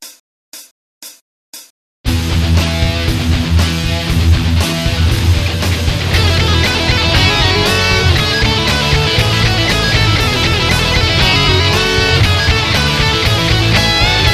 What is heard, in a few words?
solo, vox ad120vt